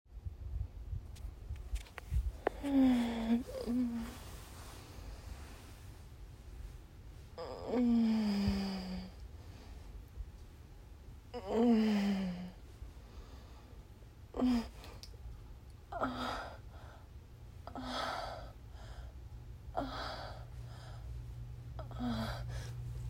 moans whimpers and rolling orgasms, real orgasms; for 10min; Overstimulated rolling orgasms; multiple orgasms; masturbation

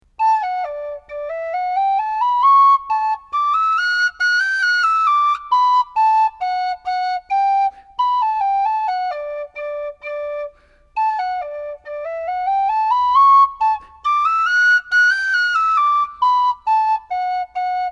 planxty hewlett on tin whistle

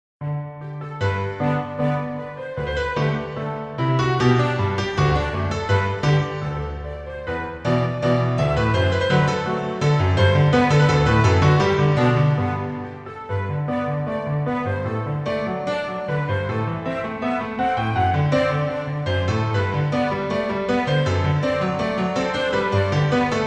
Orchestral Arrangement

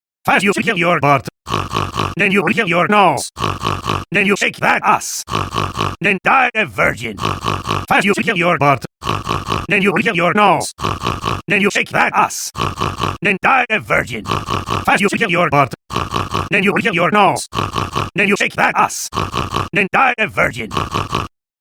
SNORT SNORT SNORT (Acapella)

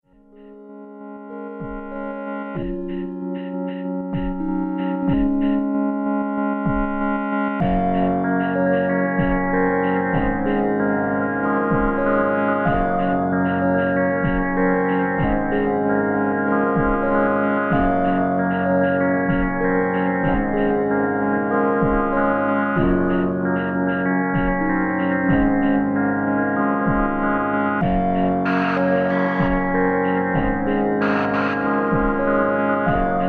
song sketch

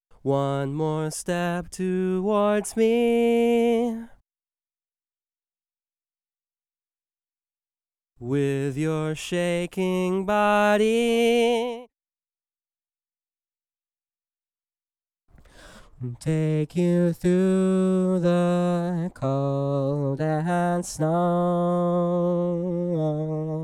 extra harmony low, Dry Vocal Stems; 120BPM

